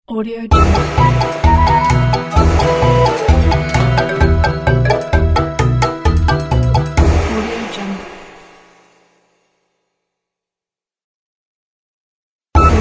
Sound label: comic ethnic jingle logo